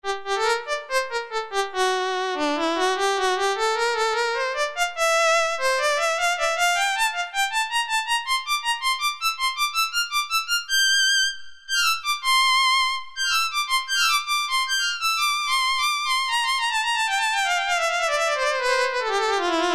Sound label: SoloVioln, Roland MKS-50; Factory examples